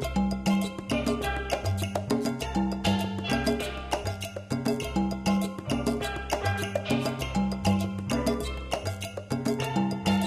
music islands; Secret Agent

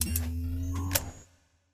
set device; Secret Agent

